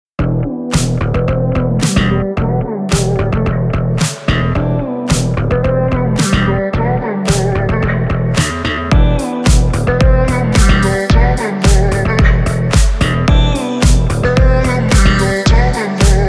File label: Retro Beats